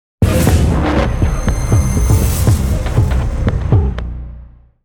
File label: Ominous Lead In Logo